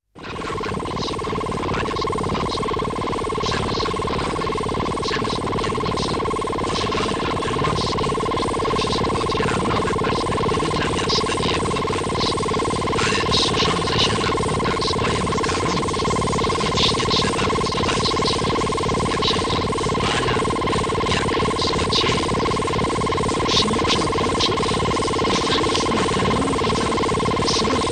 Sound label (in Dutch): stoorzender